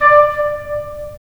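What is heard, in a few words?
wind clarinet; LA Composer vol. 3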